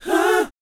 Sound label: BOYZ, Vocal, R&B Dance